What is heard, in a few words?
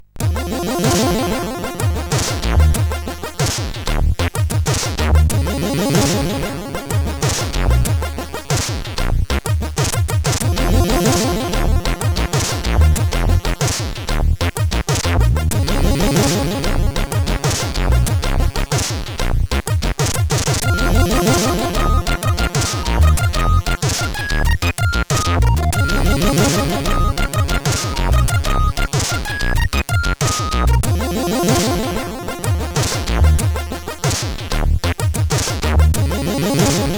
Fat Bass; CSG8580R5